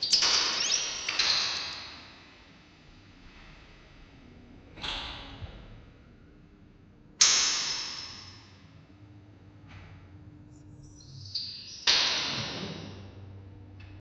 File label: broken window hall